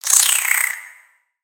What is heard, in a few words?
Spider Idle Look; creatures mechanical; Lego